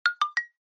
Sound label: Smartphone UI Sound Speaker